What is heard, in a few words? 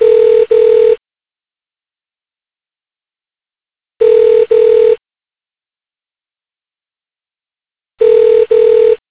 ringtone